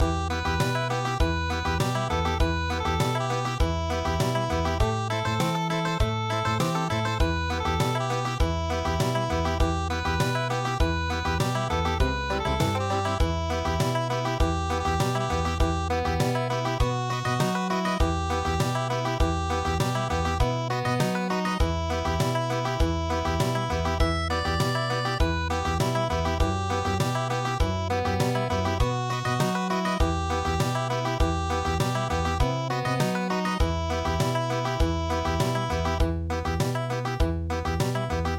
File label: Country, midi